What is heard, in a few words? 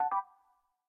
mention received; FreakyBlue; TWBlue